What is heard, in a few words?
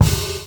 Kick Open Hat Combo, Drums